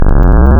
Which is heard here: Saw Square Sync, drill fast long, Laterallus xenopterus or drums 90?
Saw Square Sync